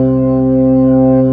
B3 C 2, organ; AKAI S-Series CD-ROM Sound Library VOL-1

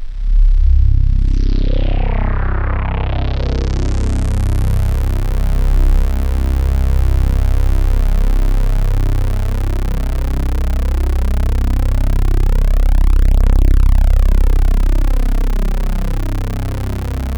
XXL SWEEP, BIG SWEEP; Masterbits; Vintage Voltage

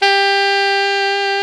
SAX ALTO MF, Tube

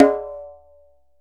DJEMBE, African Drum

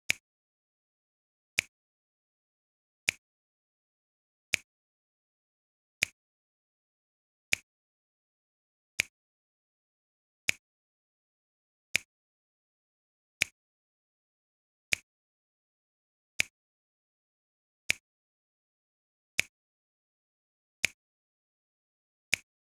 snap 2, Drum Tracks, 081 Cmin

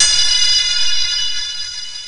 Muted Triangle; Yamaha PSR E305

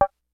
CLASSIC, DRUMS; Kid Nepro; Elite

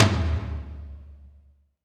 Tom, Alesis D4

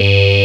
TALKR FUZZ, DANCE; Pro-Rec - Synthsonic; Z1 DANCE

